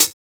Closed Hat, JUNGLE BREAKS

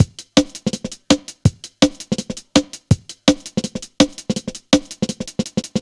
165 bap head, Drum Loops, Drum & Bass; Total Drum&Bass

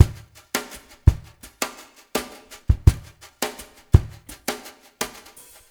WET; Spectrasonics; Liquid Grooves 1 & 2; SAUNTER

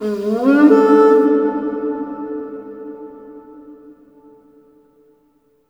HRNPHR, Heart of Africa; AMB. HRN FX

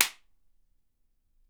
WHIP; Miroslav Vitous - Percussion & Harp

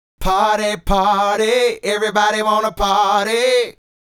Male Group, Hip-Hop and RnB Vocals

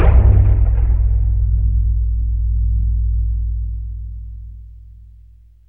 aether drum; Sonic Foundry (Sony Creative Software); Ugly Remnants VOL-2; Beatstuff

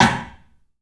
CLUB X STICK; 3D Sound; 3D Drums

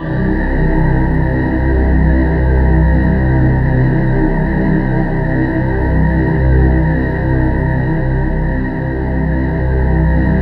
C1, Synth Leads

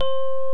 Electric Pianos

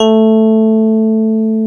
KEY EP4 A 2, Roland, E.Pianos, Digital EP's

